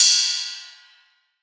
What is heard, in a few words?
Industrial Ride; Cymbals